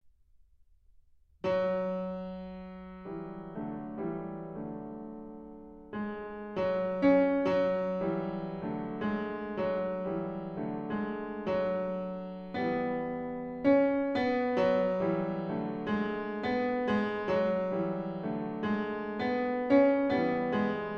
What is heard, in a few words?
Melody against Double Notes, Piano; Book 3; Mikrokosmos, Sz. 107; Bartók; Complete Solo Piano Works